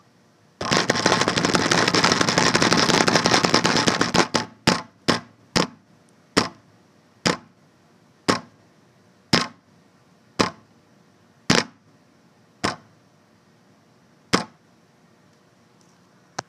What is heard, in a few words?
Drumroll